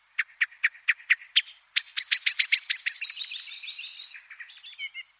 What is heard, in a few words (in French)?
Merle noir cri alarme